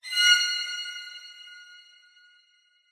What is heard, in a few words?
hit violin stab reverb shock jumpscare